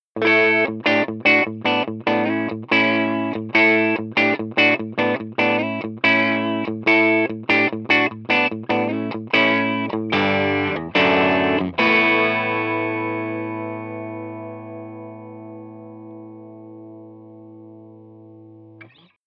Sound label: Crunch; Ascension F90